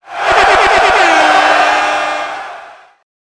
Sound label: triumph celebration